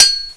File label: block; caleb